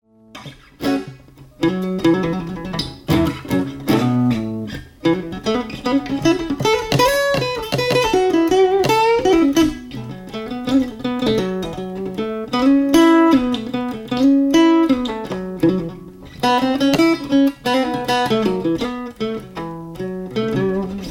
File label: stripped down